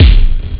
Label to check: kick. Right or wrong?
Right